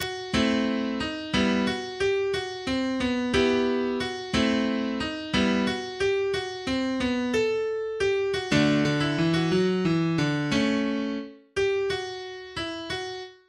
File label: Piano Sonata; no1 Oblationem